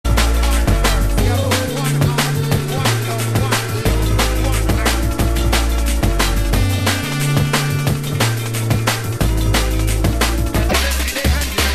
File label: Drum and Bass Jungle, UK Bass Radio